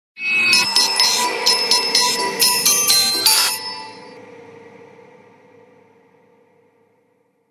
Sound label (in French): AMBIANCE; pack noel; SOUND-DESIGNS